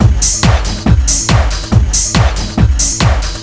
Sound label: ALPH DRUM; CIRCLES